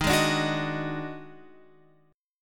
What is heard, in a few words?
D sharp Minor Major 7th Double Flat 5th